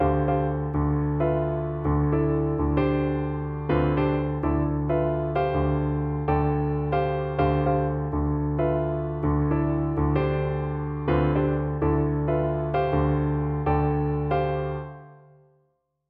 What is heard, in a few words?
pedal tone